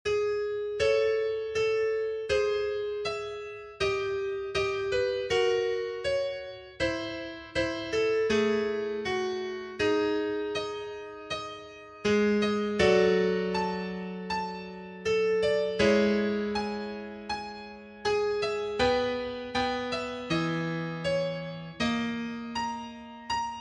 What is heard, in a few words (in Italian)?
preludi per pianoforte